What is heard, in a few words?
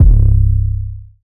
808 C; Cymatics; Oracle Sample Pack; 808s